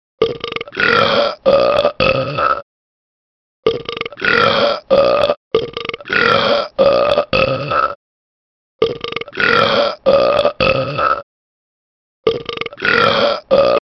Burp; Retro Ringtones

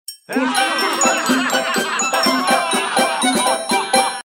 Funny; Google; NewWave Labs

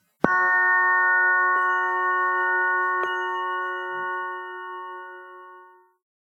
sms tibetan bowl; Mudita Pure

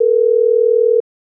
Ringback tone; LG GD550 Pure

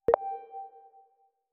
Undock

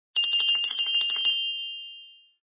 Tinkle; Nokia; 6708

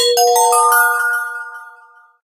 soft bell, notifications; Sony; Xperia Z3

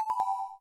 SMS in Call; LG Optimus 2X SU660

